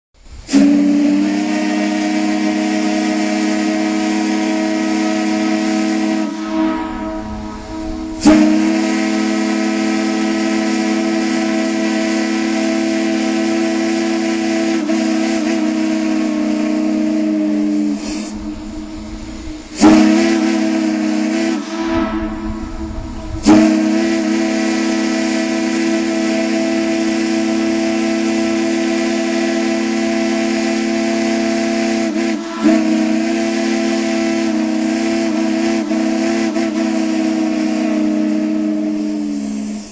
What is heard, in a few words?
horn